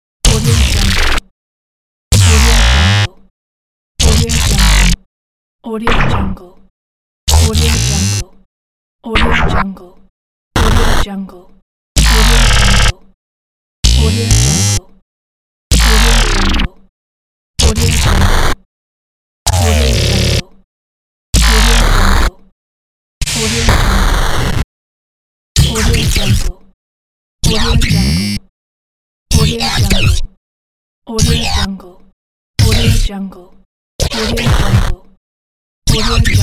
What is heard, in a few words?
electro house sample set